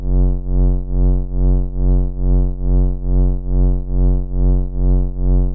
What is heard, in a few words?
Amplitude Modulation Lo